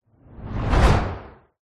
sound design sweep transition hard hitting whoosh dark; zapsplat